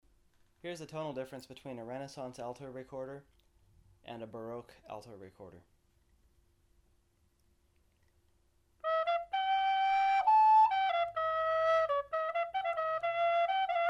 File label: recorders